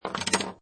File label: Pinball Sound Ball Land Roll And Touch Metal Objects; Video Game